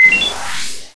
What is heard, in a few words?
ng7 beep, weapons